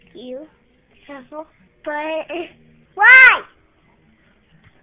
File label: Acapella